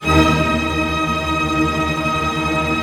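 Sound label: String Tremolo D#, Left; Orchestra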